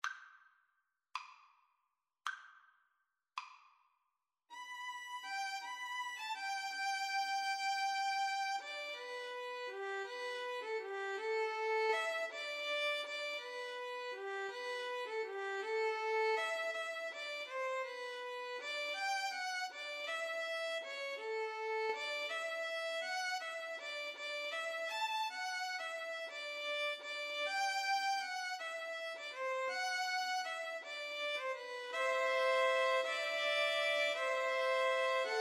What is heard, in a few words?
violin cello, Violin-Cello Duet